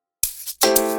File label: Afrobeat type; Beat By MajorCee